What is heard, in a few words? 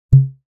pong sound